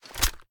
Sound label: reload fast waffle end; reloads; gmod; iw8